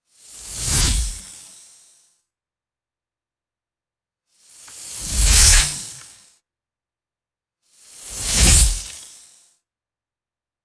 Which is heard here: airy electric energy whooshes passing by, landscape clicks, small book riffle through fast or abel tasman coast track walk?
airy electric energy whooshes passing by